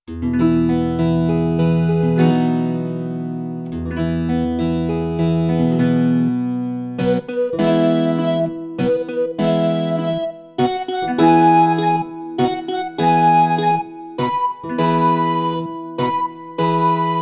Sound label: londons burning backing